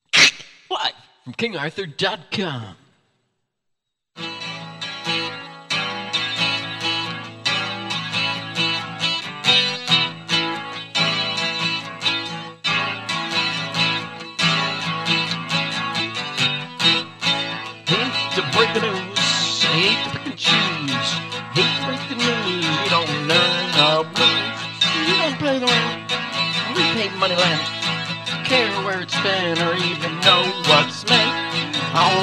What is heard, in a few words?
Soundcheck